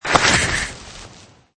Skiing Snowboarding Jump Takeoff Or Ski Slide Turn; Skiing And Snowboarding